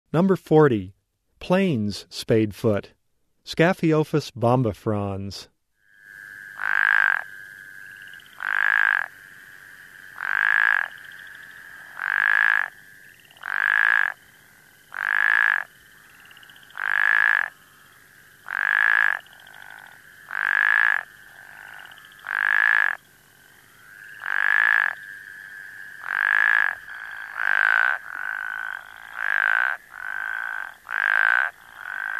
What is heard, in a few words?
Spea bombifrons Plains Spadefoot, Frog Calls; Herpetology; Bio227 - Herpetology